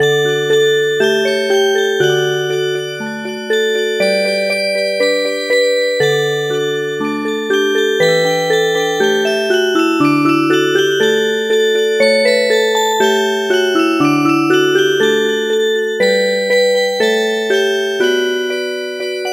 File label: tuta nana tgu Music Box